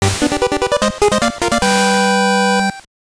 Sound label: Floor Clear; Adventures of Lolo 2; NES